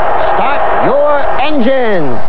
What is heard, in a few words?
start engines